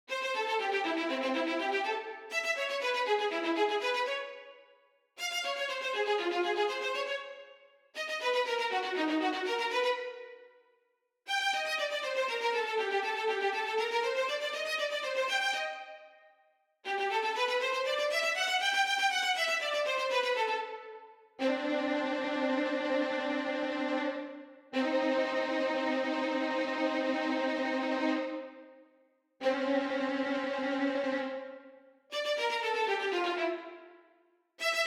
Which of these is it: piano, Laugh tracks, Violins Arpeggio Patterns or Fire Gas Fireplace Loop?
Violins Arpeggio Patterns